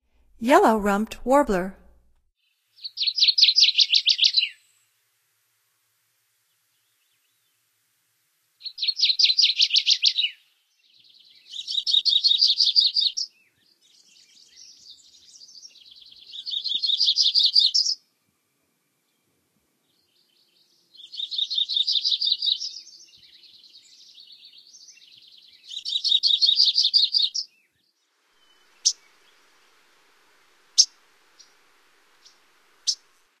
Yellow-rumped Warbler, Songs of Birds of North America; Stokes